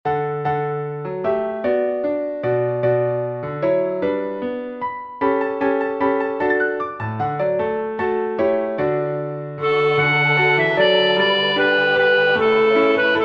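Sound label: soprano; Schubert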